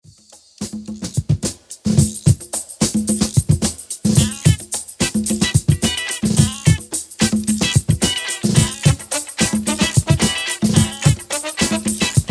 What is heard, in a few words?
Tropical; funky soul